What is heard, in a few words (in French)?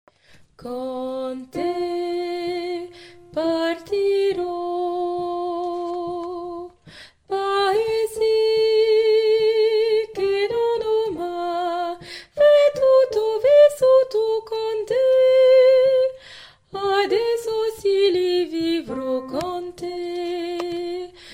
Con te, soprane refrain